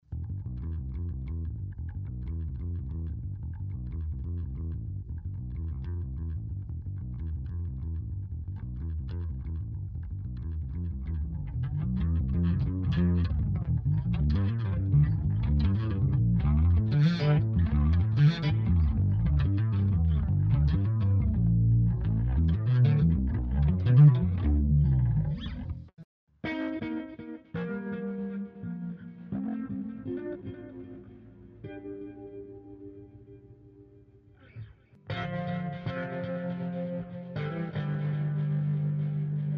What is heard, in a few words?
Bass Solo